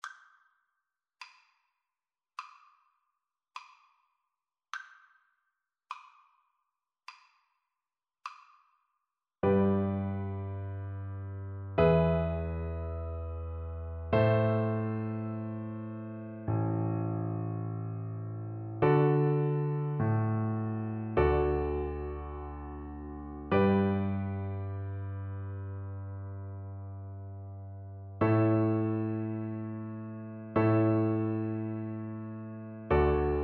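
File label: prelude d VLC karaoke, Cello; carcassi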